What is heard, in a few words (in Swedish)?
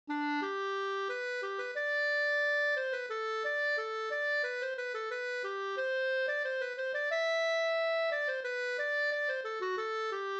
brudmarsch; e Dal Jerk; Rattvik